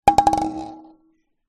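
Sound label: musical kalimba down on hard surface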